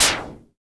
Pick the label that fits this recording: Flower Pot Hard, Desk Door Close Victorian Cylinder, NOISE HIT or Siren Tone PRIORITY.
NOISE HIT